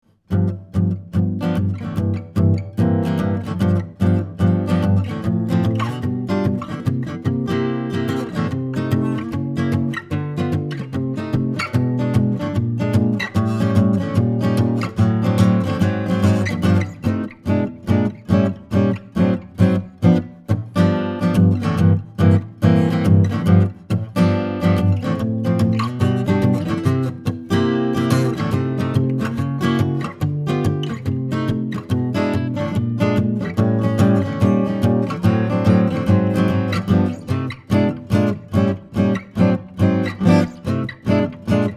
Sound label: Jazz, Neumann M269; Audient Black pre; no additional EQ, compresson/limiting or effects; Santa Cruz OM/PW